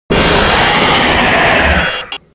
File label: jumpscare sound; another story of ripped